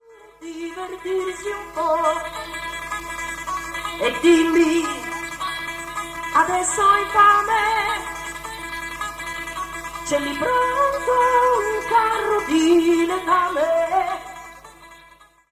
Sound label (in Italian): voce e chitarra Inedito